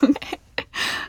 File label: Emote Female Happy; Idle Champions of the Forgotten Realms